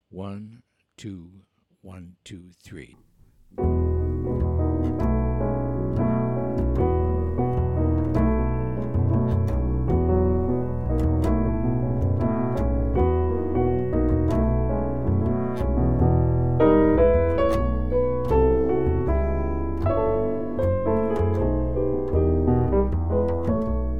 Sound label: minus Drums